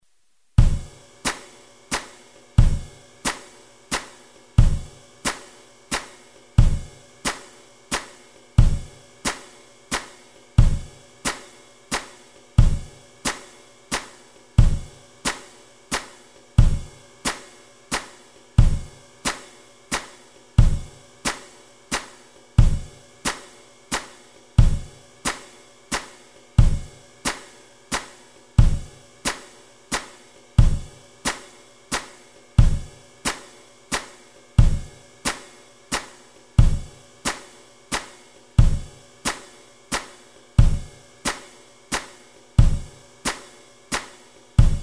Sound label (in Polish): cicha noc perkusja